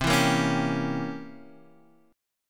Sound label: C 7th Flat 9th C